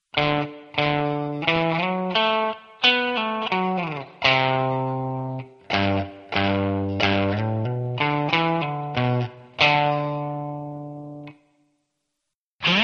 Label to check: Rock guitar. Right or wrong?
Right